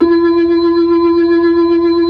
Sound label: B-3, Blues